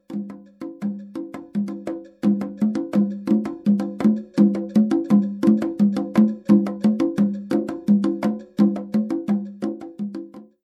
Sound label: congas